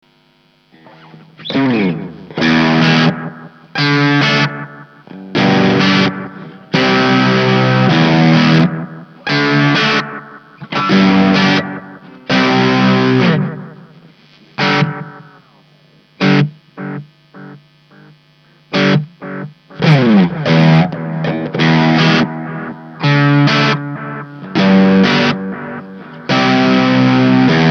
delay, modulation

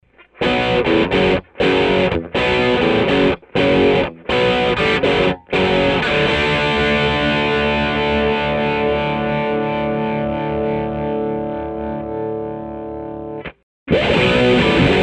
distortion; Dr Boogey

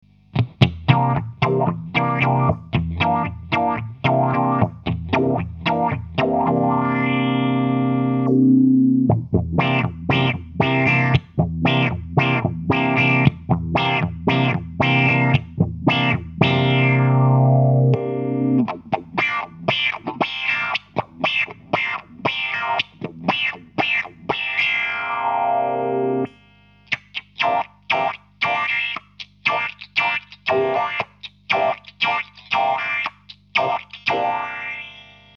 Mutron, filters; Mutron3

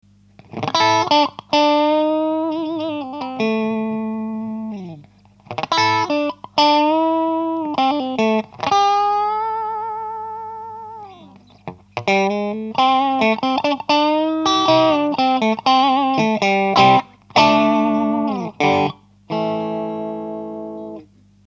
medGain, distortion, Klon

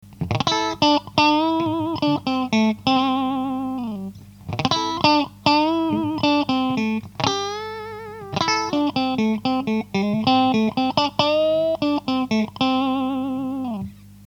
min Gain, distortion, Klon

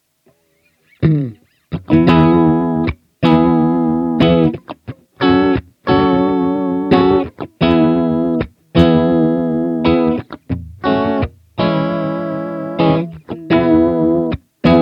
Vibe

